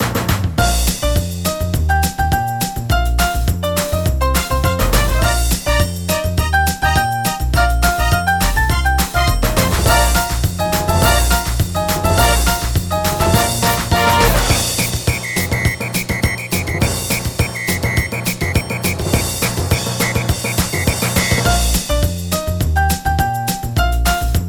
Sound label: Jingle Menu; PS Vita